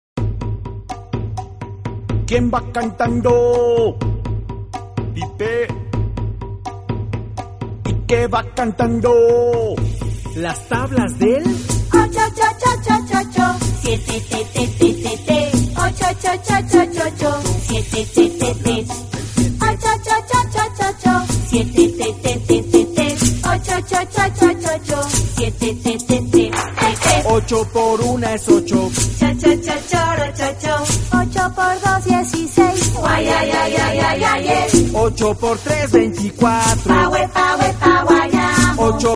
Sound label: pipe